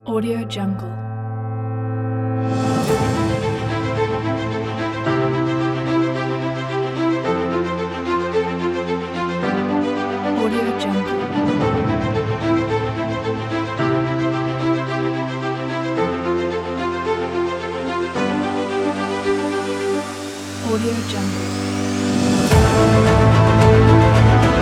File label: epic; vitolef